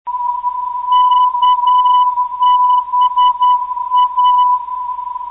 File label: 431 kHz; ndb; kba